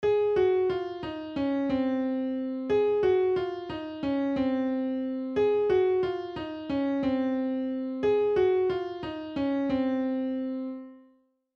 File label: KEY of Db